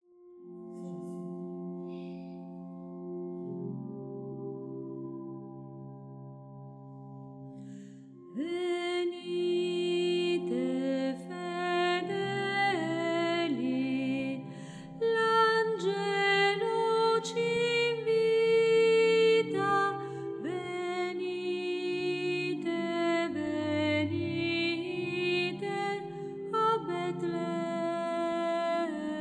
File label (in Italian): soprani